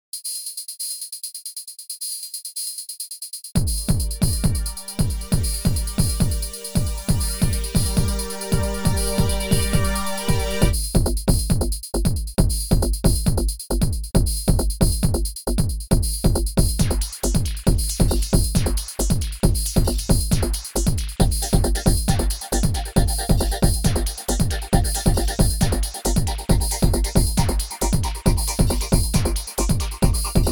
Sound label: Tech